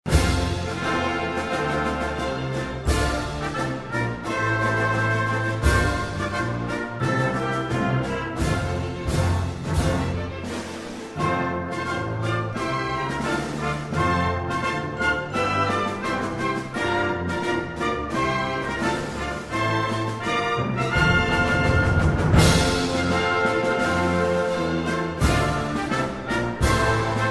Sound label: Saudi Arabian anthem